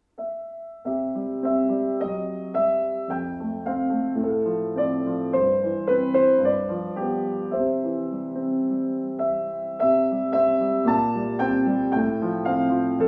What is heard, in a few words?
Bethlehem piano